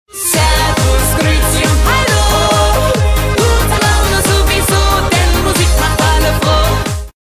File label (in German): Jingels